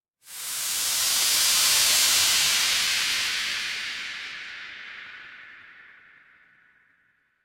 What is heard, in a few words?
horror wind whisper